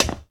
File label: glass step; Golden Crayon Sounds